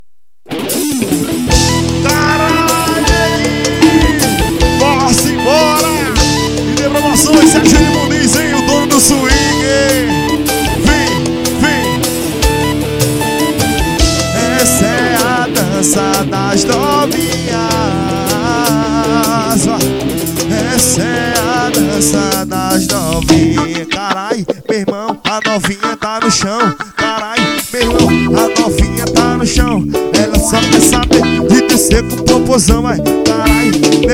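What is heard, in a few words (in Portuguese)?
forro, piseiro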